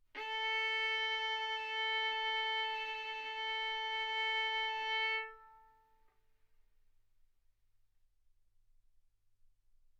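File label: Violin arco mf A string A4 B4 stereo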